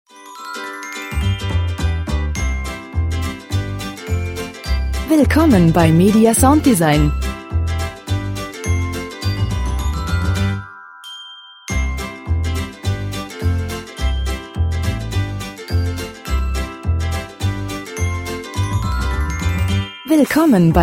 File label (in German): gute laune